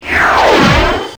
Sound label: Ion Cannon Release